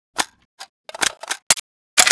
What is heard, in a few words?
bar reload, weapons foley; Spearhead Demo; MacFormat 2003 June